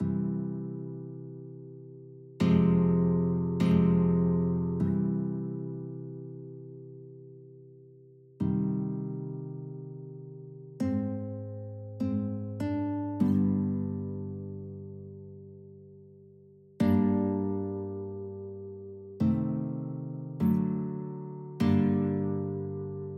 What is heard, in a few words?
march purcell, guitar